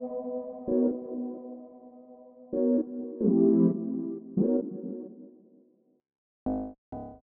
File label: Synth Loop 130 Bm